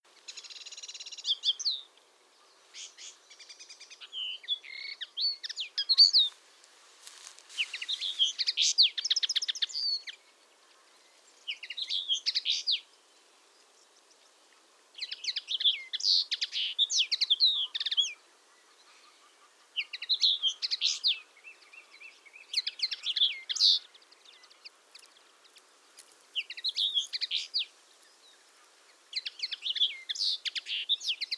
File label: Common Linnet